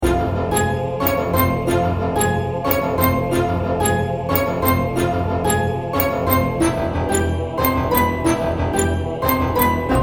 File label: instrumental